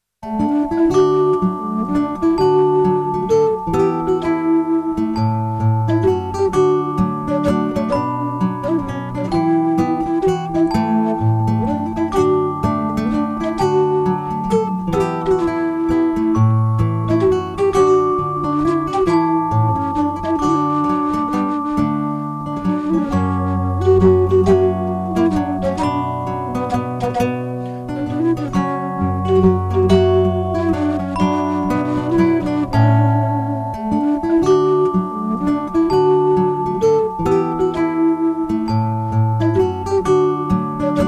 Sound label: Loop